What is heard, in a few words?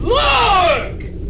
look sound effect